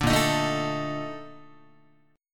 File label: A sharp Major 11th Guitar, chord; A#M11; strummed; JGuitar